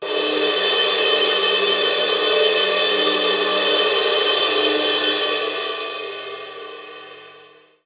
Hi Trill

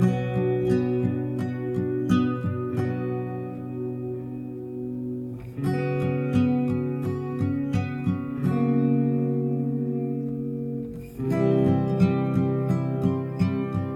Ambient, Guitar Stem; Helen's House - Ambient 4; PS4 PS5 Switch Windows Xbox One Xbox Series XS

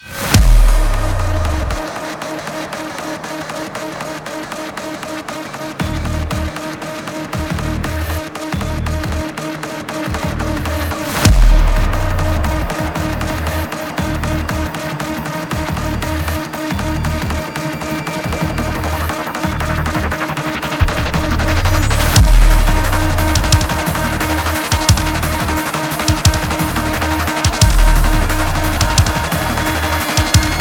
heavy; Doom; SkullHacker

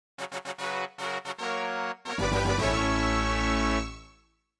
MIDI Victory; Secret of Magia

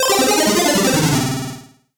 MUSIC EFFECT Solo Chip C64 Negative; startide macos switch windows gamerip 2017

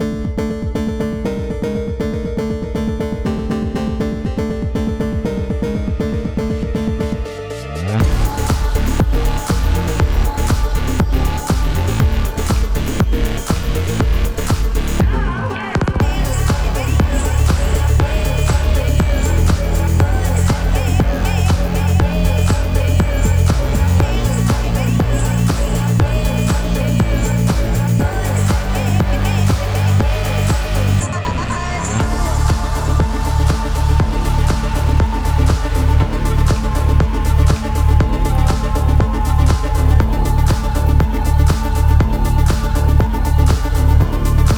Music Phonk

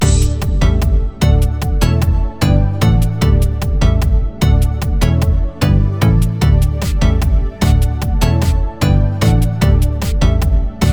happy short loop; Mech League Hunting

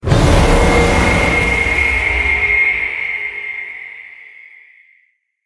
Accent; Vacuulus gamerip

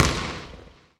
Ball Bounce From Height Regular Court

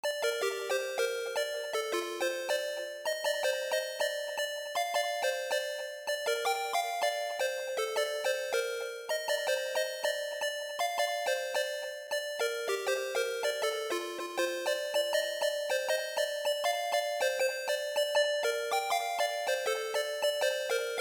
Melody; chippy

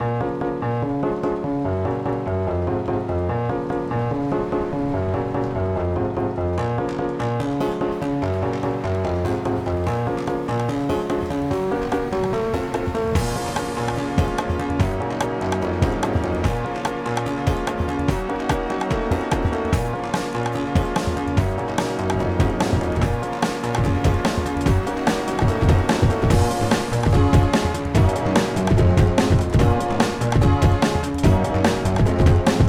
Instrumental; Friday Night Funkin' Vs. Oruta; Android

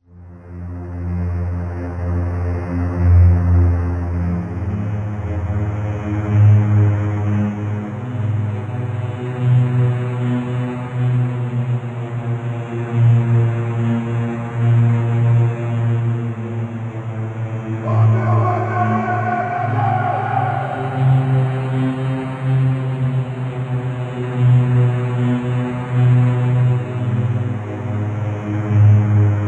Cave or Dungeon Walking; Pirates of the Caribbean; Xbox

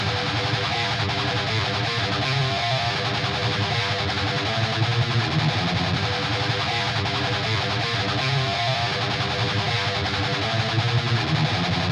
Drums and Jingles Battle GUITAR

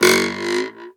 Jump; Spongedunk Jump

